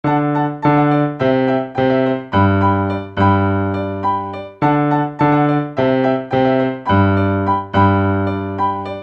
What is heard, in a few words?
Piano Cat; Mellow Island; My Musical Cats; Android, iOS